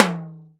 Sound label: Tamborim; Multisamples; Digital Sound Factory Planet Earth